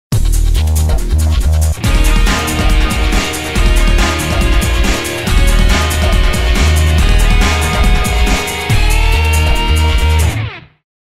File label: Stadium Victory; hot wheels bash arena